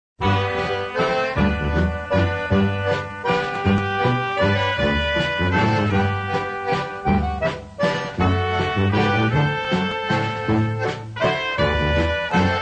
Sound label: WALTZ